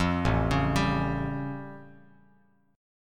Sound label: A Augmented 7th A Bass Standard; arpeggiated; 13,0,11,12; JGuitar